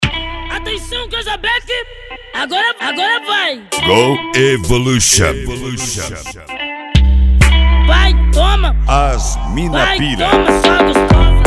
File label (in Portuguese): grave mg funk tumdumdum funk bass